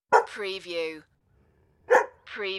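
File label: Dog 3 Barks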